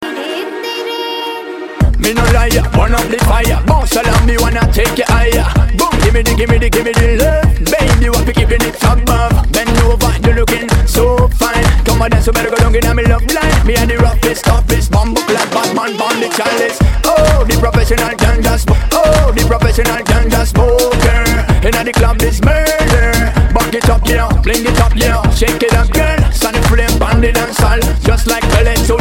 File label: Club Mix